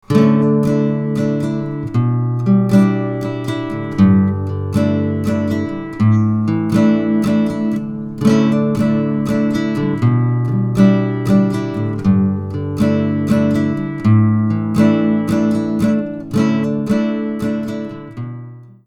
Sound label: guitar strumming